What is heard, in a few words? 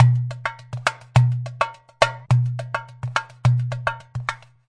dumbek walkabout, 105 bpm, drum loop; 101 to 110 bpm